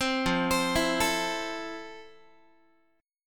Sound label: F Major 7th F, Guitar; arpeggiated